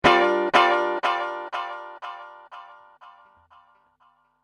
Highpass

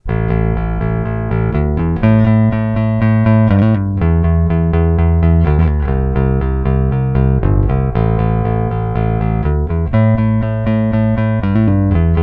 Middle pick

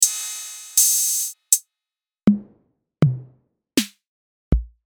TR-606

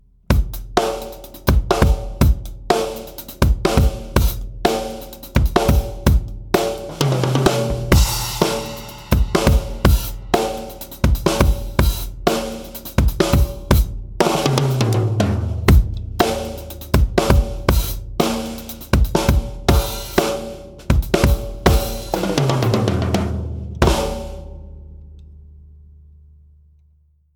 Jungle; YAMAHA EAD10

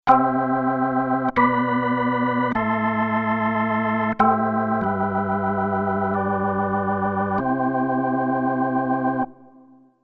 Crumar Mojo Desktop; Alone in the City

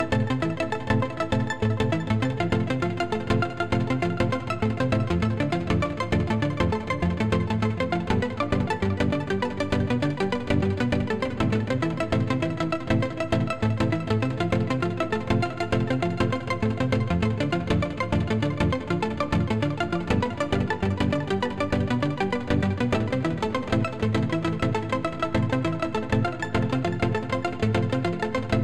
ButterSynth iOS JX Rhythm

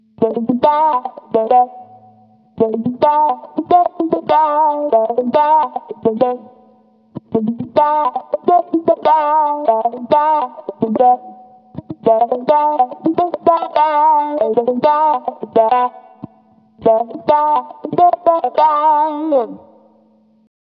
Auto Quack Comp on Single Note Line

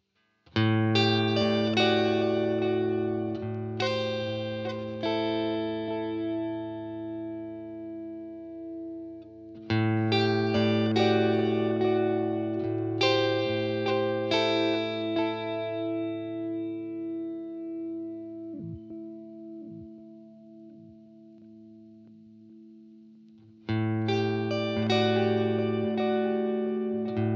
Indie Analog Delay riff; VALETON Dapper

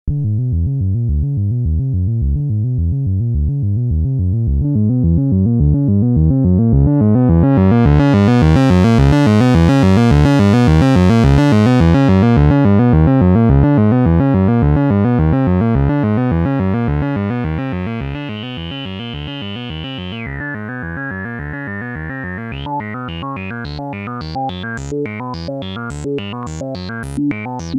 techno

